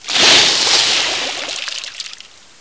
quitsch; SERennen

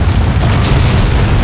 Vulkan; PC Gamer IT CD 48; 1602 AD; 1602ad demo

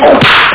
schlag dreh

